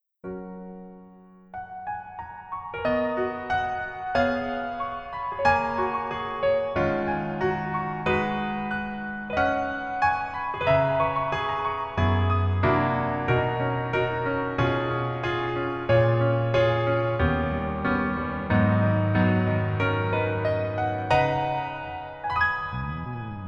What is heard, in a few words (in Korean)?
Piano ver, 멜로디MR, -1키; 더킹, 영원의 군주